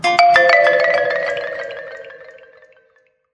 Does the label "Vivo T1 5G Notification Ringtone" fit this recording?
Yes